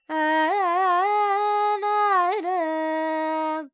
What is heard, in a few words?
Major, Carnatic Female, Alaps, Sankarabharanam, Dry; Vocals; Sample Banks